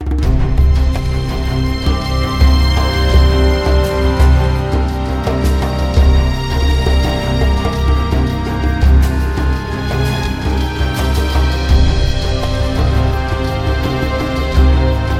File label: orchestra; Soul Calibur